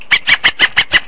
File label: whiney scratch; Scratching and Turntablism; DRUM LOOPS and BREAKS